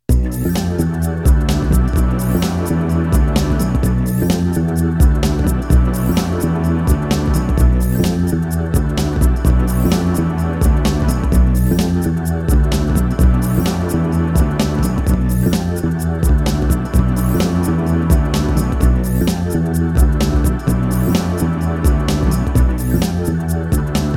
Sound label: RIFFS